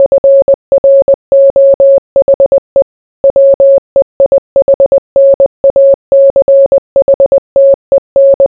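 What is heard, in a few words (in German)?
Morse Frohe Weihnachten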